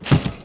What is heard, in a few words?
Expander; Fitness; Neighbours From Hell Console Edition; GC, Xbox